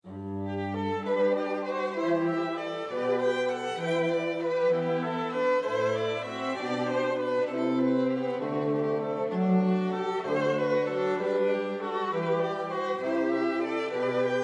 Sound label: Jesu Joy of Mans Desiring, String Quartet; Bach